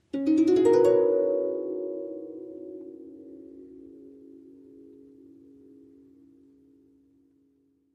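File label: Harp Medium Strings Short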